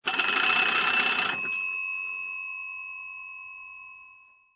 antique wall phone

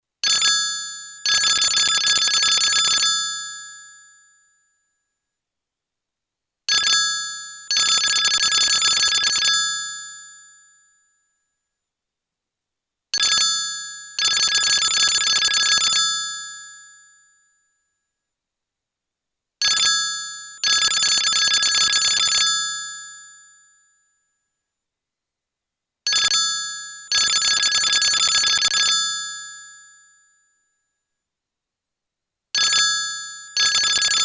Old Arubian Phone; ringtones

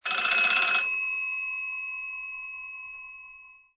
antique phone, ringtones

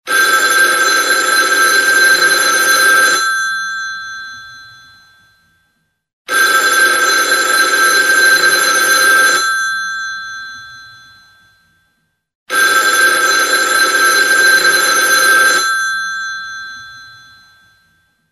Old Phone Long Ring

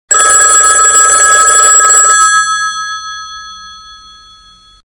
Old Tele Bell Loud; old phones

